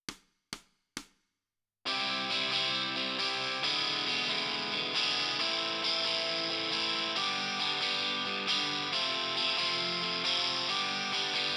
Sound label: Guitar Left